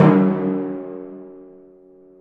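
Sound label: Timpani Hit Medium; Digital Sound Factory Virtuoso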